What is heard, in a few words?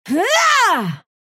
effort melee big